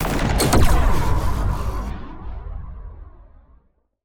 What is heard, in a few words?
Large Sci-Fi Explosion